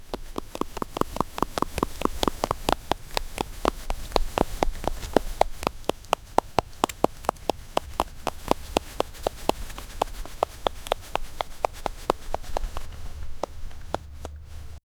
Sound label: the sound of a corset, clothing